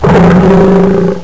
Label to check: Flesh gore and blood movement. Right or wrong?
Wrong